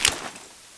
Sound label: pin pull, flashbang; cstrike; csgo